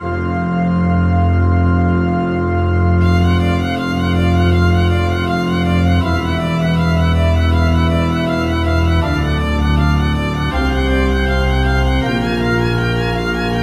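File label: Symphony No1 Movement 3 in C minor Lento, MIDI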